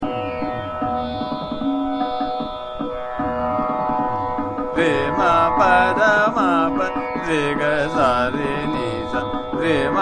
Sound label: Sargam - Re Ma Pa Dha Ma Pa - Ektaal Drut, Desi